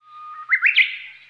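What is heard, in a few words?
bird chirp; EnvSound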